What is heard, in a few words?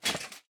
armor; smite; god sobek